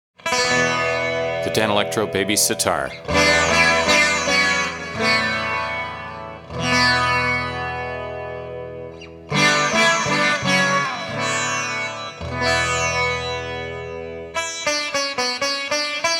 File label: Sitar